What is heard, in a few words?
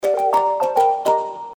short bell